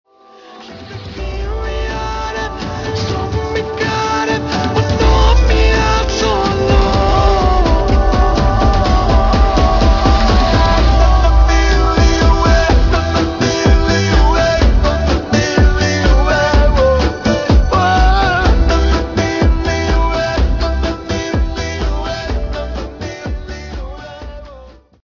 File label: Ringtone